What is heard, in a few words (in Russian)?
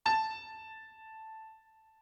la piano